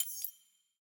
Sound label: sfx update list item select; banners